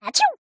cartoon character cute high pitched sneeze

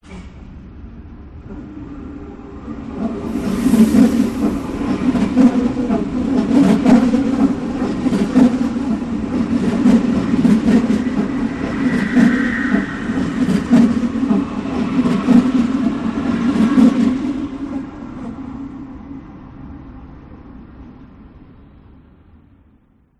train wheel track friction; the sound pack tree

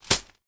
bill letter put down on pile of others; foley

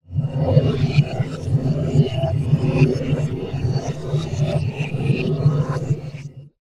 big underwater reversed reverb bubbles; sound design element

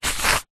tent ground sheet scrape movement single; foley

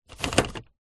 vehicles car fan mode dial turn toyota rav4

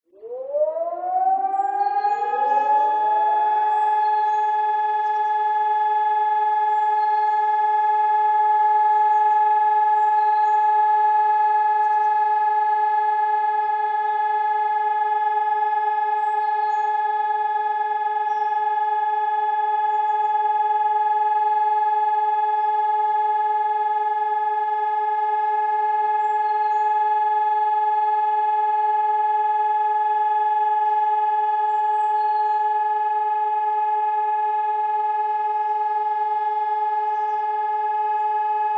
air raid siren

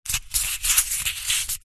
polystyrene ball small handling; foley

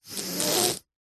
human sniff snot cold nasal close up short; zapsplat

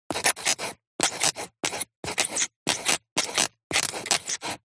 Handwriting QuillPen Medium; D&H; soundbox library

